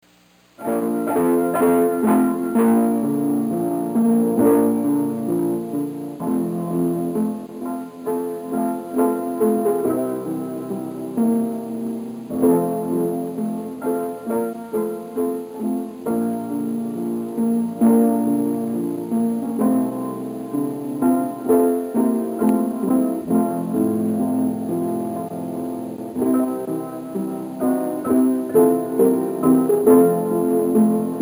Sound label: Piano